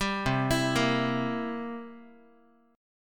C 7th C down Guitar 4A, 4E, 5A, 5D, 5G sharp, 6C sharp; C7 arpeggiated